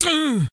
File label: Inmate Fight Pain